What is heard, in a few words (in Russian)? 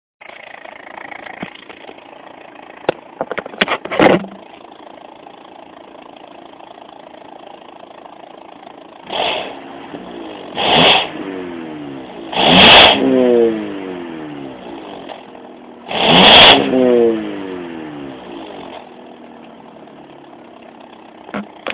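progorevshij glushitel, burnt-out muffler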